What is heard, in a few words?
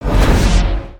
scythe charge; weapons